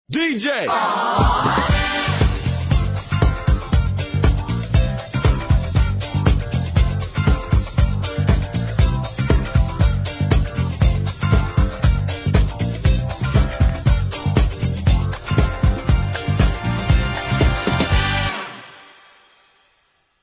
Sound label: groove